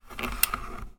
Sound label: household heating thermostat dial turn